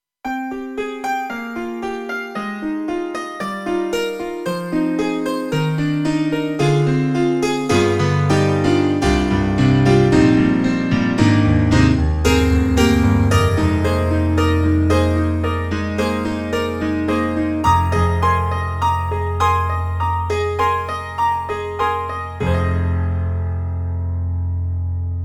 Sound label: Special Forte; KORG EXB-PCM08; TRITON-Rack